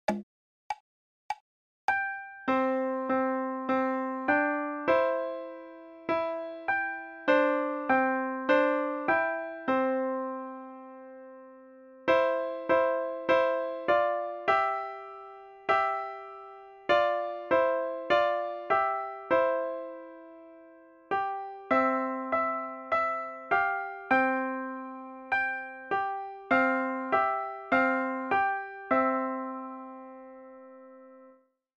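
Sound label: Sweetly Sings Piano Accompaniment Both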